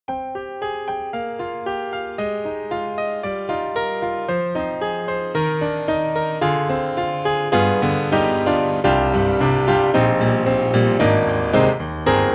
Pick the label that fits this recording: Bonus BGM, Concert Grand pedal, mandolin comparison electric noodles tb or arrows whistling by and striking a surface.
Concert Grand pedal